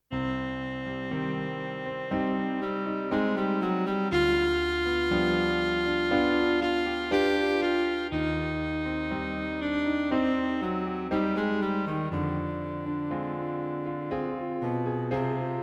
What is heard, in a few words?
Cello Sonata; Cello and Piano; The audio clip is from the second movement - Lento.; Cello Sonata - No 2 Lento